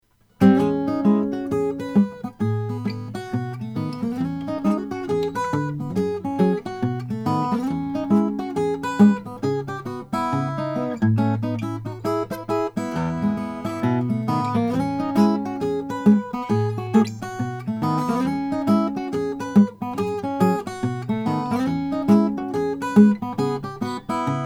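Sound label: koa acoustic guitar; nickerson custom fc3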